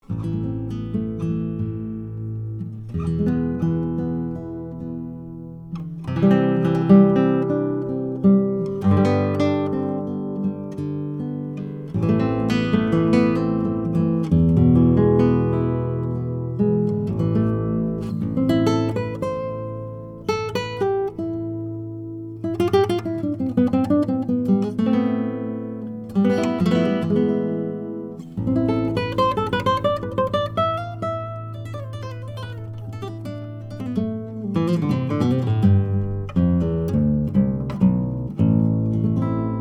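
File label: Improv, vintage AKG C414 COMB condenser; BARTOLEX SRC7 GUITAR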